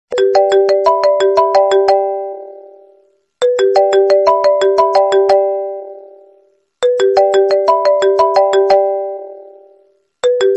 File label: iphone sms ringtone